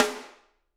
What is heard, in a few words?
SD, Drums; Bob Clearmountain Drums I